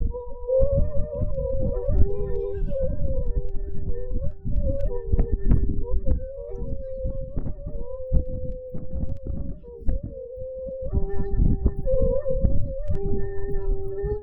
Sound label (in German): plastikharfe